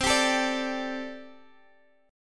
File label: G Suspended 2nd Suspended 4th C